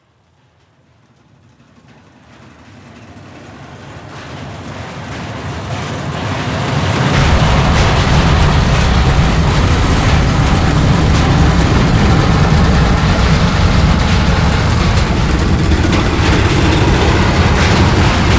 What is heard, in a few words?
falling, ambience